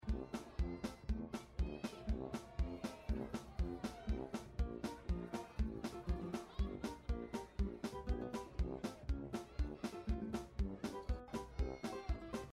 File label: bgm circus